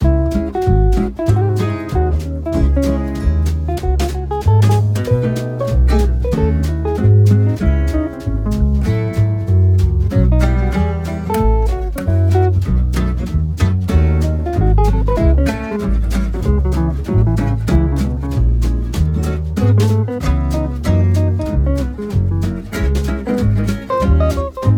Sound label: Instrumental jazz, Gypsy Style